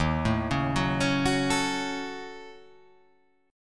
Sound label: D sharp Minor 7th Flat 5th D sharp down; arpeggiated; JGuitar